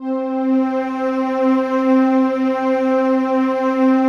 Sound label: PAD, DIGI-PAD